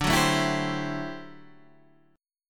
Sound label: D 11th D, down, Guitar, Standard; D11 strummed; JGuitar